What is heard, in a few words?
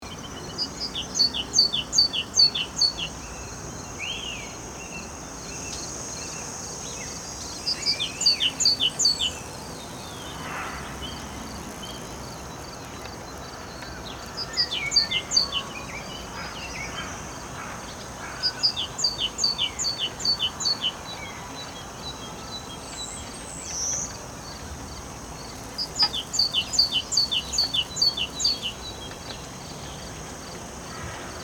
Morning farm ambience birds singing